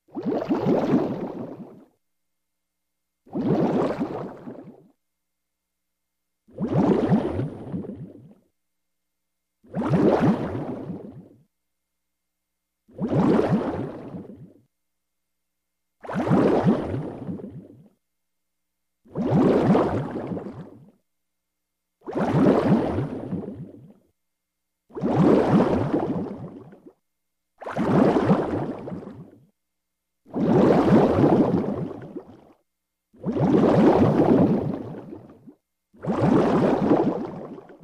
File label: Bubbles Released At